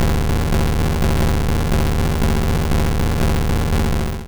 Earthquake

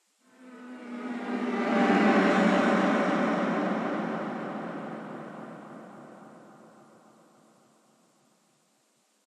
Combat String Start

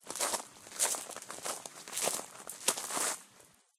Walking On Gravel; hello neighbor pre-alpha alpha 1

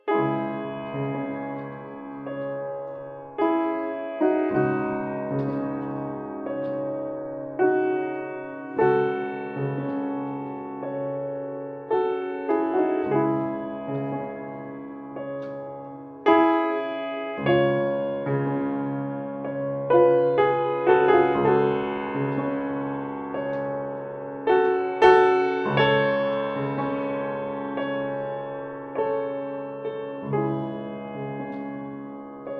Piano; Assassin's Creed Syndicate

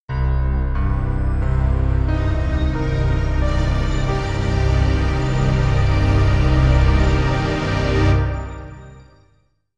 Wins; Rygil

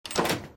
door close

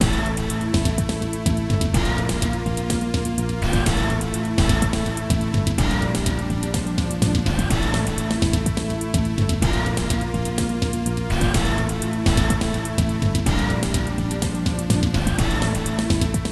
Winning; marvel super heroes; arcade; MAGNETO

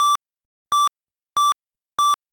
SFX Doctor Success; life is a game android

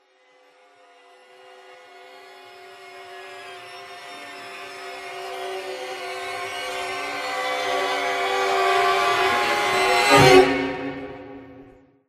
Combat Strings Pad

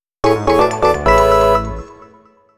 Home Menu Banner; Picross e collection 2011-2018

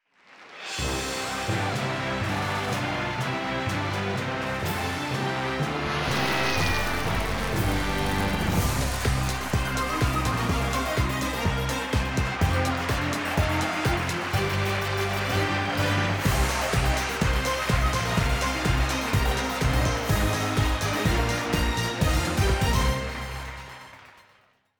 Intro Fanfare

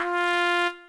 Trumpet tone; LEGO My Style Kindergarden; macOS; Windows

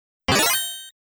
Coin; G-LOC Air Battle; Yasuhiro Takagi

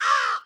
Crow; Lucius III

